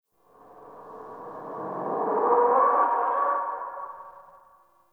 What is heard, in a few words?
Backwards Whisper Yell; Warframe; Entrati